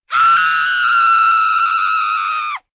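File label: scream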